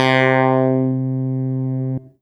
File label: Yamaha MU90R Phase Clavi C3